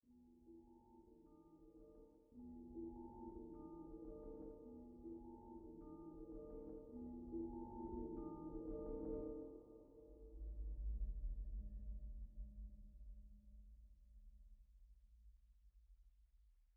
Tension Low; AB Tension 4 Low Block 2

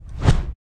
Boss Punch Start

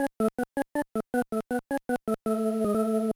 Jingle, gba; backyard baseball 2002

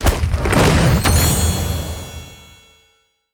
sfx rocks to shards; Animation Throwdown

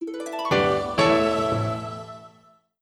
Unused Fanfare

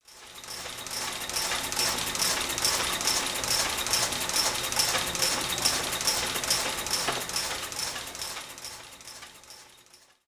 saltwater taffy machine

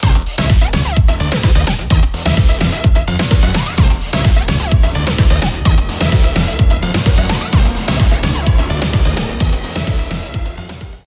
Stinger; DFH Stadium

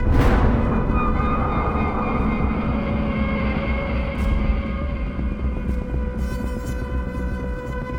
STRING FLUTTER HEAVY; Transformers Dark of the Moon The Game; L2 SENTINELS DEAD

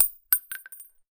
CASING 7 62 mm Hard Surface

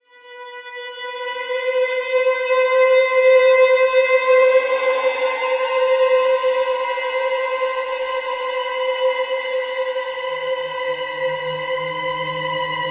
Ambient Strings Voice Piano; kholat gamerip 2015

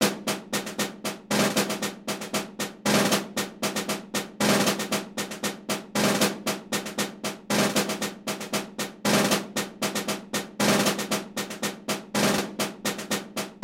bgm sea battle; World Flipper Android iOS mobile gamerip 2019

